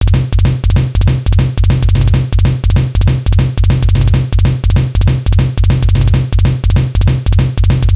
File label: drums